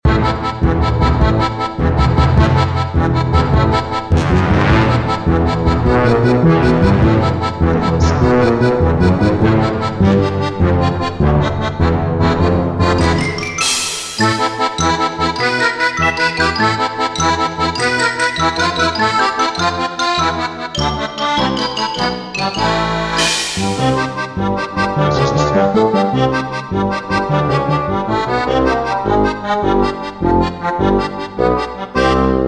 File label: Funny Hectic Loop